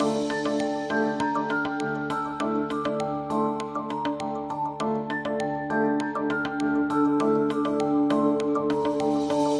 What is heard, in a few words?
Loop